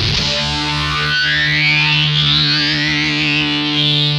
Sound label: BIG DRILL, Funk Guitar; Zero G - Funk Guitar